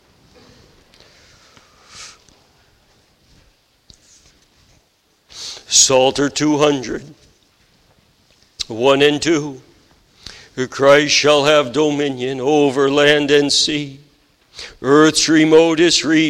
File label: Singing; The Strong Man Overcome; luke 11 21 22